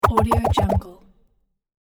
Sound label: cartoon whoosh rattle